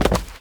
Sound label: Body fall; 100rogues